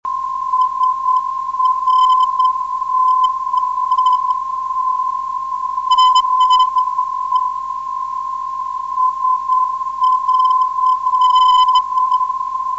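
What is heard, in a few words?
407 kHz, non-directional beacon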